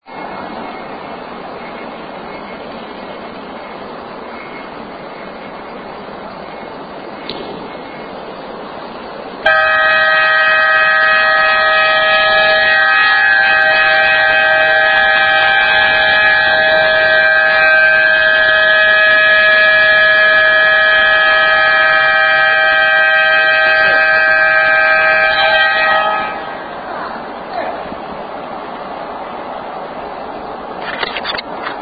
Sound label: Red Alert Horn Pattern